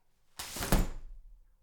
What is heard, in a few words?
Opening The Umbrella